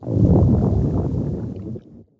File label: growl large; fistula